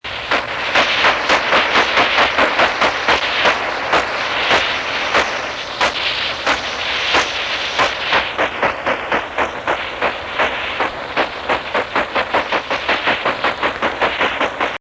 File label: sperm whale